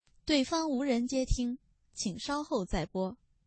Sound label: video chat tip on Timer